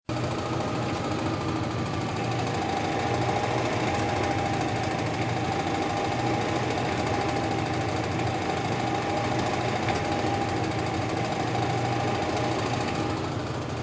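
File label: noise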